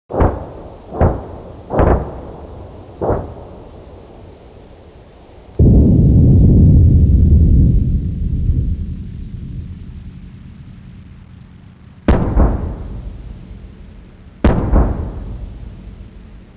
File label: bazooka ambient